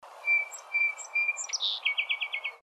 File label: song sparrow whistles